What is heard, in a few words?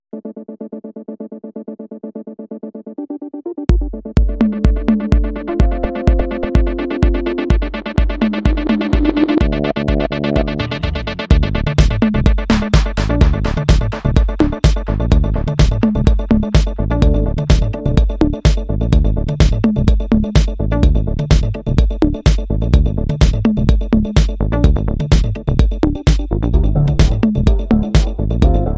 Electro Vibes